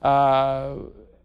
uh male uncertain; ooh ahh; uh um

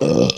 burp short

